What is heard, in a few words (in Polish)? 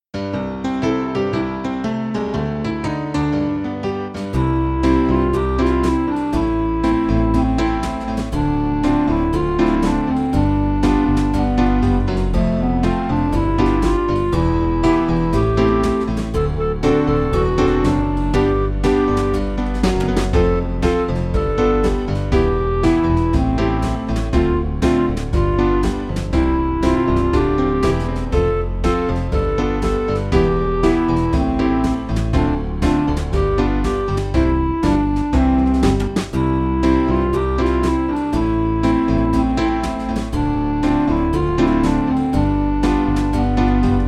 Spiewanka o piosence 1 ton nizej akompaniament